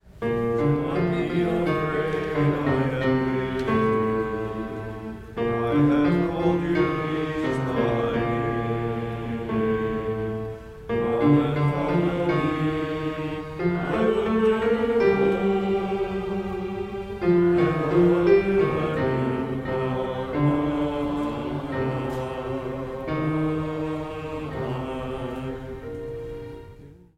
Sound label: You Are Mine bass (harmony part)